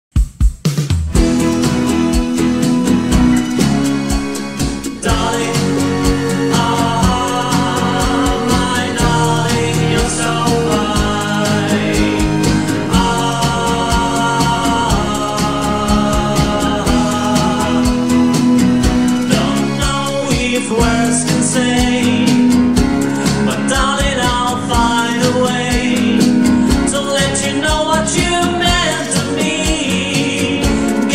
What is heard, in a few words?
cha cha cha